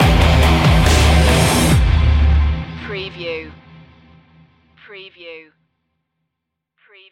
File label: the eye stinger